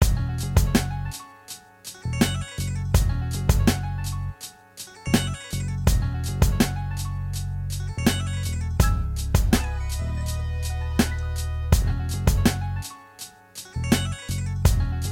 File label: blues